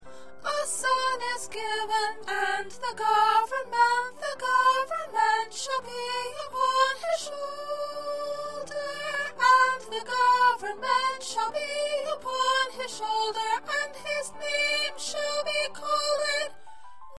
Soprano

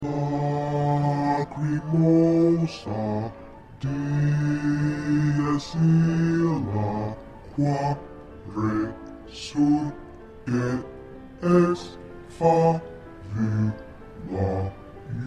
Bass

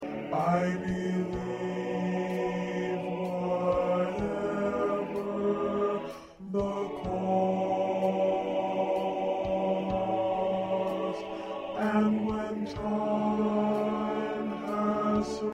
Tenor, choir parts

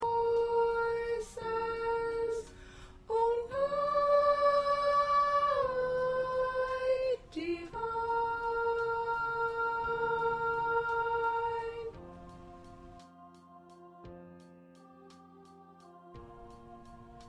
Soprano, choir parts

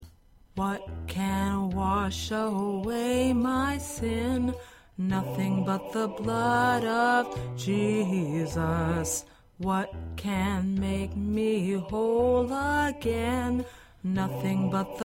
Lead, choir parts; Nothing But The Blood

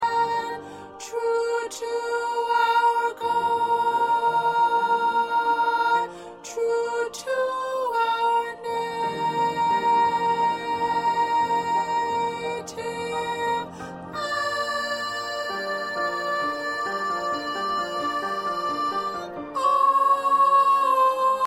1st Alto, choir

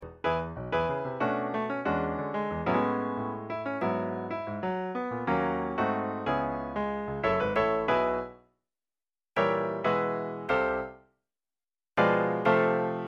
Piano

